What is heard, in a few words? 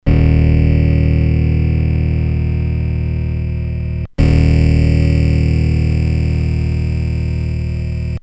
piano; commodore amiga